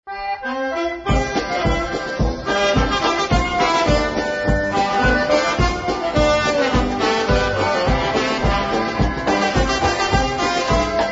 LINDENAU POLKA

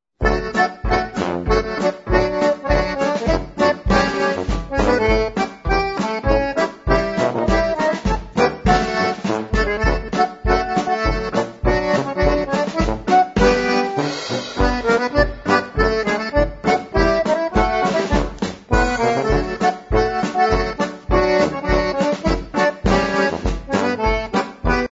polka

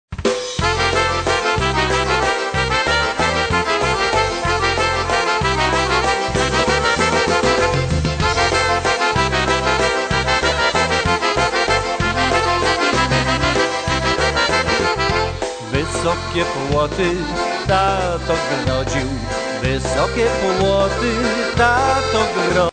oberek

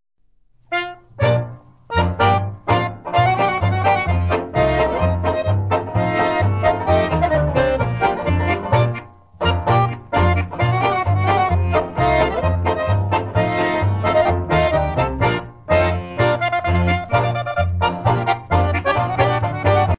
POLKA

